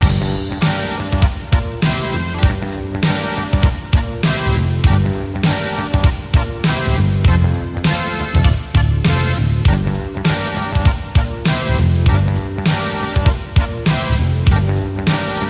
funk, instrumental

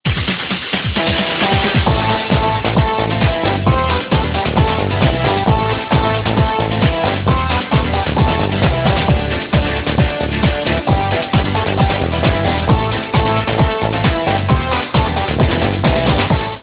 ringtone dance, instrumental

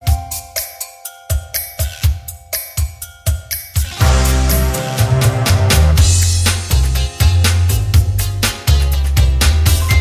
jazz, instrumental

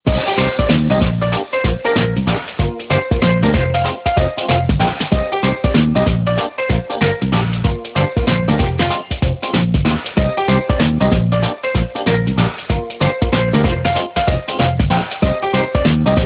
ringtone pop shuffle, instrumental

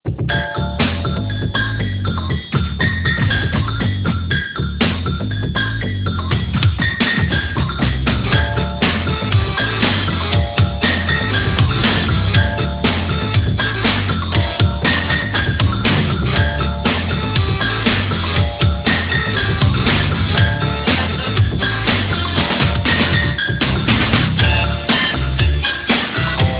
russian techno harmonica

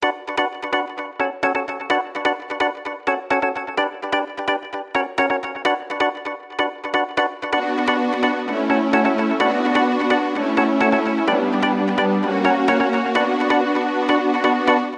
ringtone modern disco

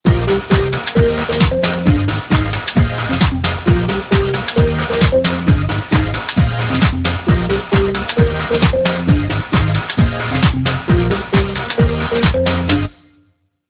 ringtone trance

